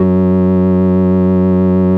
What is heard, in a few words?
FARFISA, F#2, Vintage Keyboards